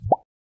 Email Sent

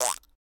Squeeze; Samsung; Galaxy A56

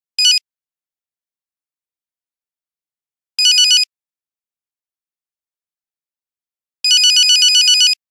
Nokia Buzzer Polite; Windows Phone apps

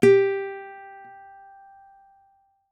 dial tone guitar

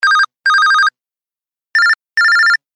Electronic Ring, Ringtones; LG SU900 Prototype

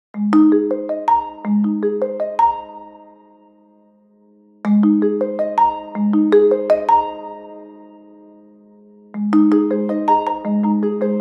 Clock, Nokia Tones; Morning; Windows Phone apps

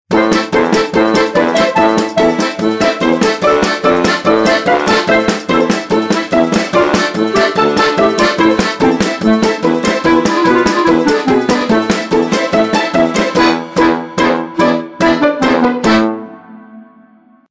Chanukka Dance, Windows Mobile ringtones

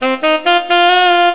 Sax, Cisco Unified IP Phone 7945G

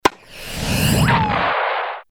bounce

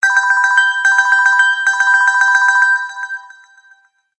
Default Ring, ringtones; Pantech Breakout

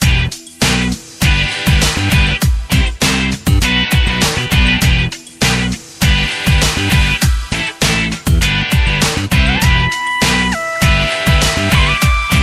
ringtones; Samsung; Galaxy Ace Dear SCH-i619

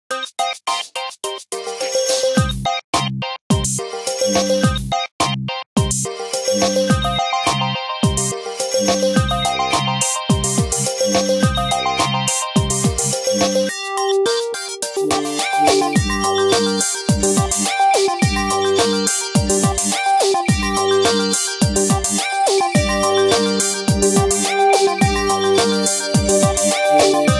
Pings, Nokia phone tones; 6230i

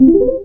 Video Resume, Cam EffectTone

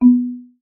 Marimba, notifications

